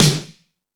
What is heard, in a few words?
Fusion Stix, Drum Machines